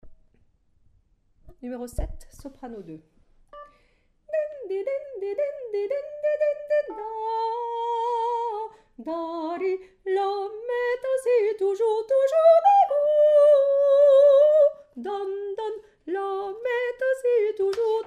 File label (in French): Soprano; pop